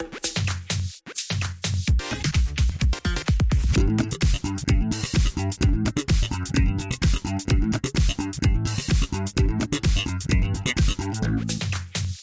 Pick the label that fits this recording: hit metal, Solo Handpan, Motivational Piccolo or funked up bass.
funked up bass